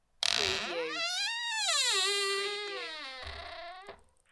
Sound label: Door hinge squeak; beanstalk audio